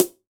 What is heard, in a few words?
AMB SN; WORS STATION; ROCK